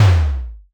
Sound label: impact low